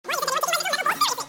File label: metal balls